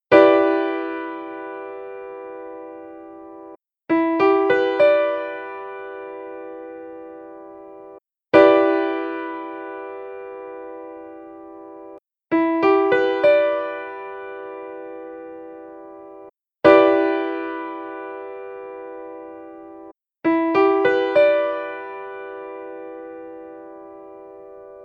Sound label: Em7 Piano Chord